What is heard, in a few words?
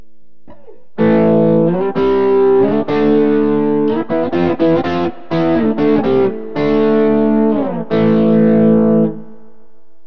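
dragon bridge, guitar pickup; burny; fugly clips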